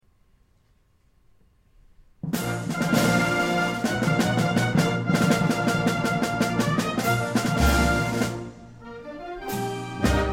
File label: fanfare